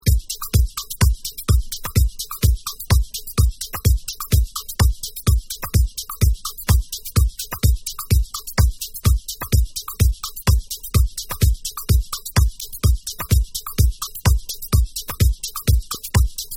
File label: Drum